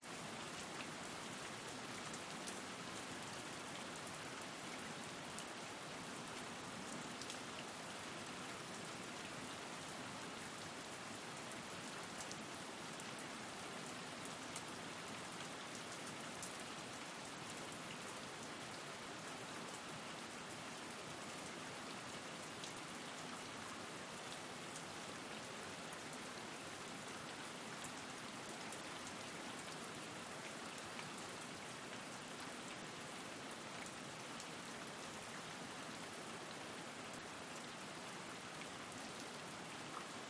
RAIN Exterior Street Medium Pavement Steady Thunder Rumble; gain walkers